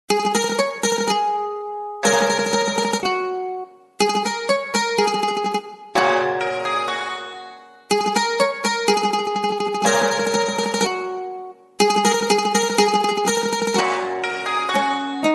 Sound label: background music; The Last Battle